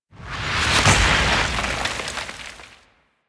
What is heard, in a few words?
Jump Attack Land Explosion; Enemies; Warframe; Infested; ZealoidPrelate